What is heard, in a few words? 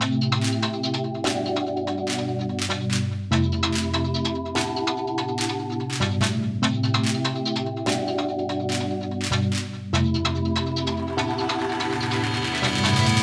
search intro; DanmakuProject